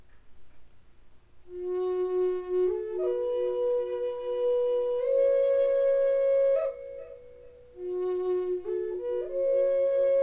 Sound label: Cherry F sharp drone, flutes